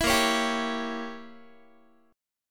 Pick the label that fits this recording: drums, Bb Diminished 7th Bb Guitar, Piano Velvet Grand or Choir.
Bb Diminished 7th Bb Guitar